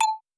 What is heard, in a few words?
modern futuristic notifi; notifications